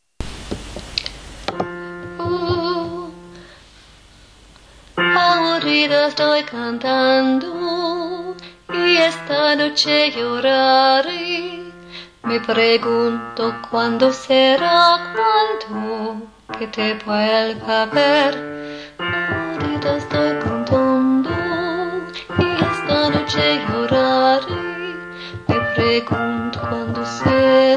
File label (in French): basse tutti